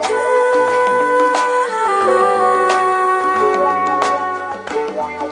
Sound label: flute singsong, Loops Phonky